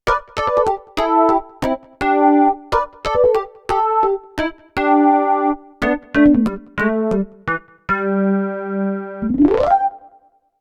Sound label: modal electronics cobalt5s